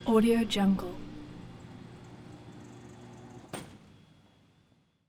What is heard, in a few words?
airport electric door noisey airport terminal; urban sounds; درب برقی فرودگاه